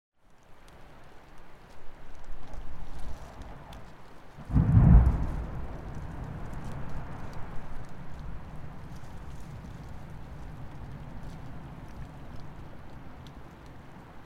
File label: glacier calving